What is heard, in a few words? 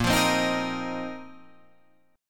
A sharp Suspended 2nd Suspended 4th down Guitar Drop D